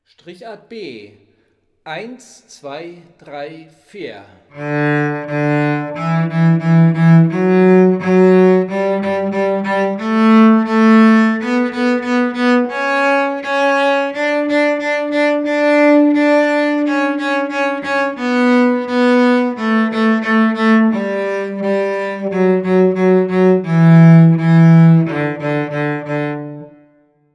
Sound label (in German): Tonleiter Strichart